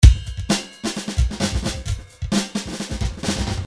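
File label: drums 130 pattern; Paul Kodish Dangerous Drums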